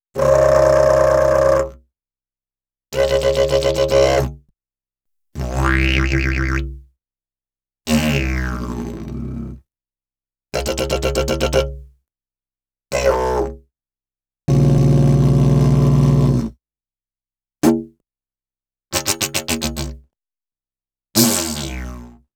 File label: Didgeridoo And Overtone; Good Stuff Audio